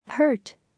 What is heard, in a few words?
hurt